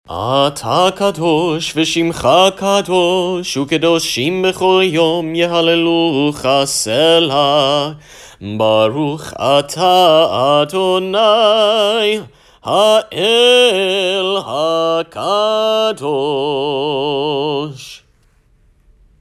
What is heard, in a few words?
Kdushat HaShem Chanted